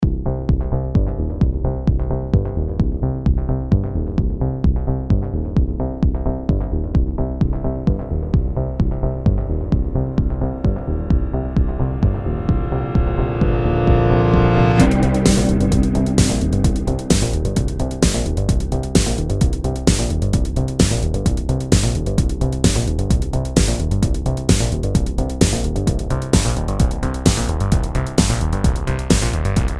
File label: piano demonstration; rubycon